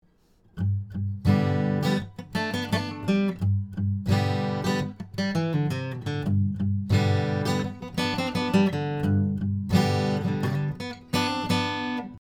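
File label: G acoustic guitar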